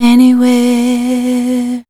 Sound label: voice spectral, ly short; Best Service; Voice Spectral II